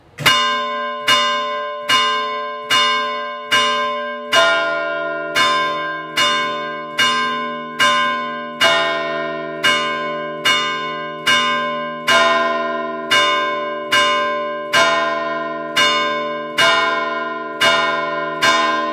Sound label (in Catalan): campana